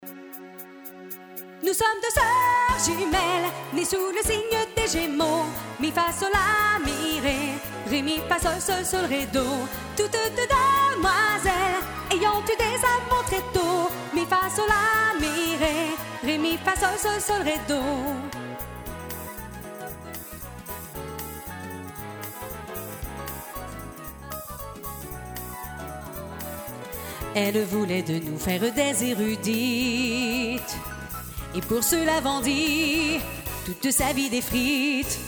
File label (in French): Chanson des jumelles